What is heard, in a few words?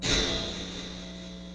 bell